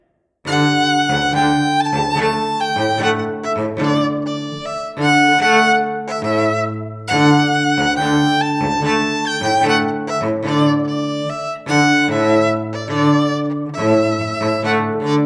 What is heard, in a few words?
ode to joy DUO